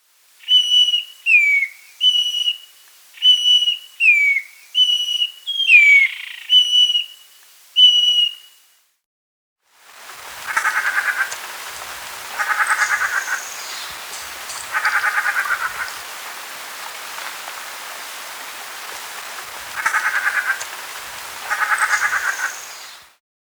Yellow-Billed Cacique (Amblycercus Holosericeus), Andean Birds; S. America